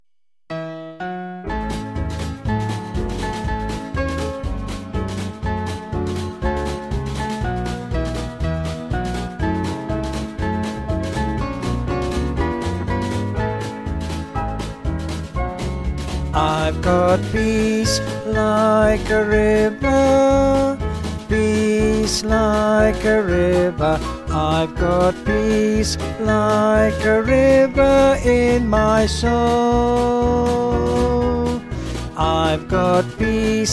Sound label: Vocals